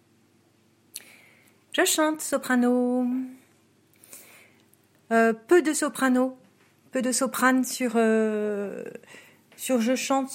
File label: soprano; bastienne